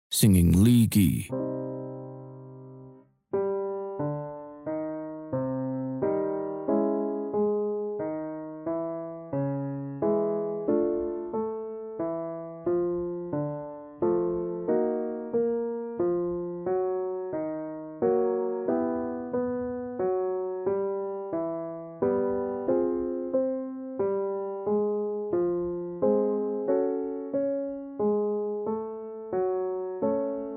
Vocal System Lee Gee Exercise, tuning and ear training